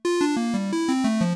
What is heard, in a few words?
Spinn